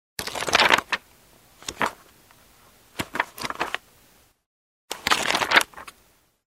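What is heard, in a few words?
fast page flipping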